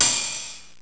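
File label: ride cymbal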